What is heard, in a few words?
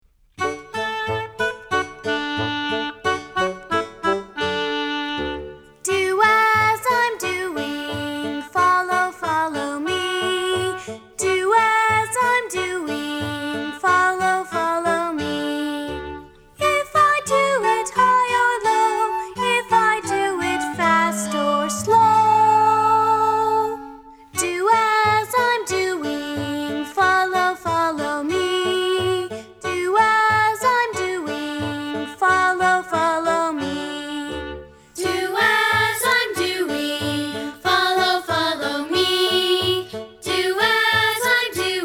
words and music